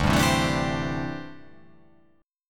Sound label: D 7th Suspended 2nd Suspended 4th D